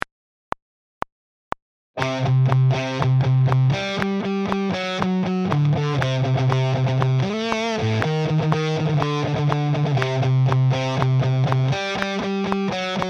Metal Rhythm Guitar

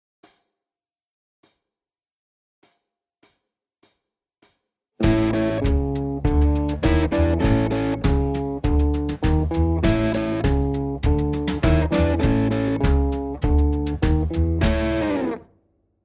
Chicago Blues Guitar; Chicago Blues Guitar Lesson

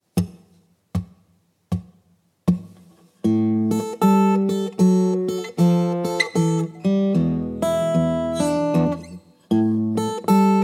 Blues Guitar Turnaround

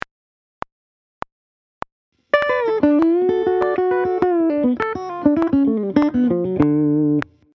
Hybrid Picking

